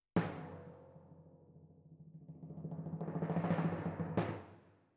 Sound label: Timp Close